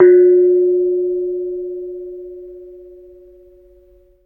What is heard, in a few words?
KENONG F4, GAMELAN; Spectrasonics; Heart of Asia